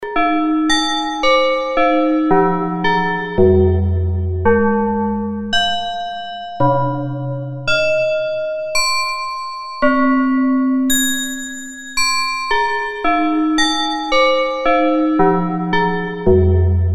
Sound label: bells